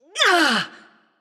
Grunt Attack; Seris

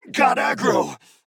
Taking Damage; Paladins; Knight

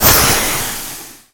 projectile launch; Venomancer

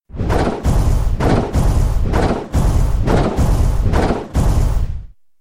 attack; Dota 2; Lone Druid

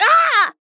Grunt Hit; Huntress; Paladins

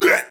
Death; Paladins; Lazarus

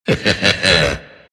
laugh; oracle

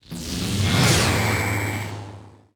effect light; feheroes

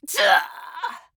Death; Furia

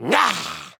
Grunt Attack; Paladins; MalDamba

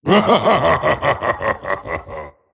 laugh; tiny

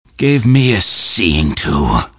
death; riki

